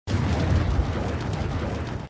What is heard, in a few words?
Ball Fly